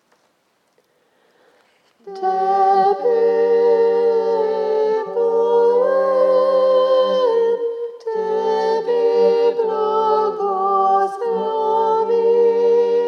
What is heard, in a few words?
tebe poem soprano